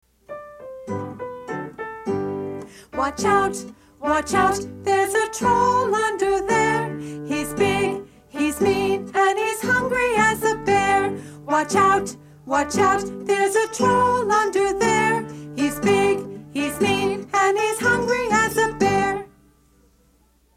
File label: watch out song with voices; nanny goat